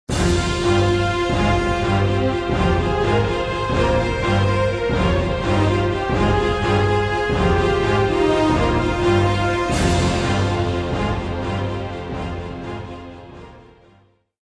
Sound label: Industrial Agitated; Civilization Revolution; Xbox